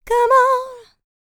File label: licks, vocal, dance; ILIO; Vocal Planet